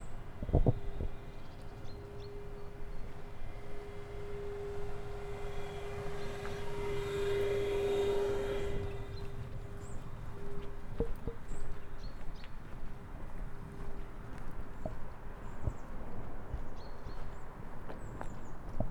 Ventilation; square coluche

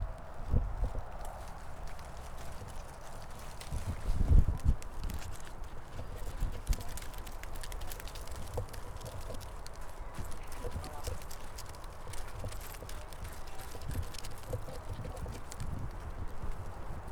Ballade en Foret; square coluche

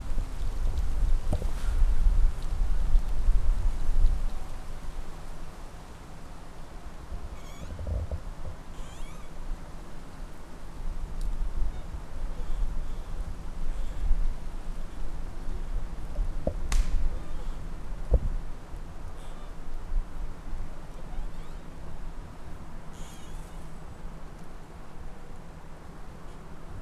grincement d'arbre; campus universitaire de la bouloie

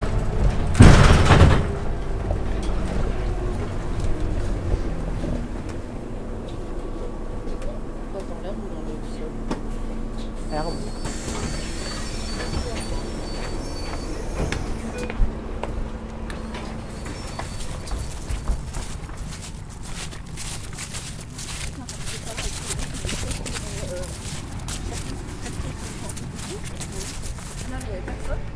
Ouverture portes; isba

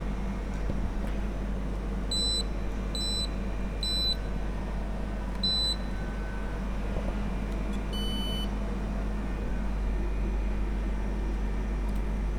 Machine a cafe; isba

